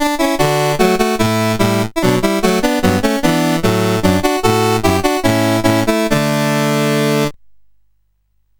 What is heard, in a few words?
menus, sid CSG8580R5; Games Summer Edition